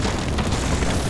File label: burn